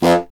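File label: wind contrabassoon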